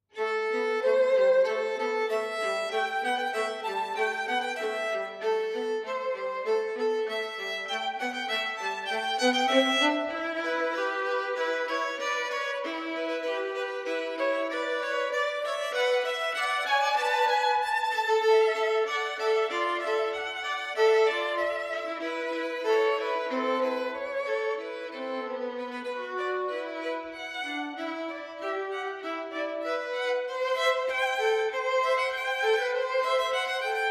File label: duomarcia; hummel